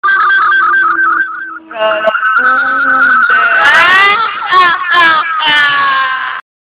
Horror

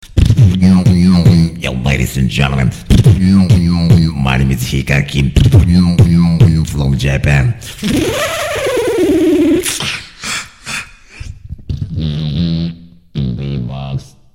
Beatbox Ring

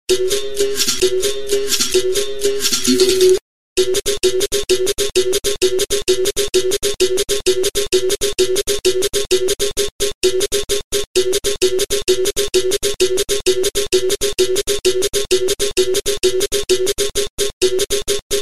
BERIMBAU SHOW

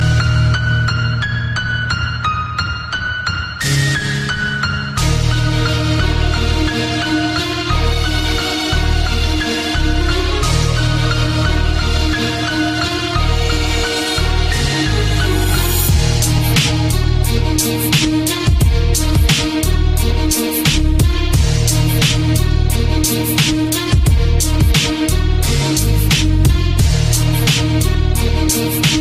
Fast Symphony Rap

